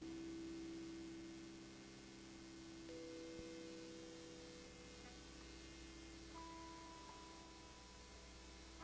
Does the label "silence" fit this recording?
Yes